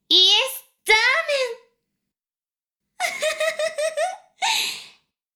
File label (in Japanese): フェラ音声; 少尉; 牧場戦腺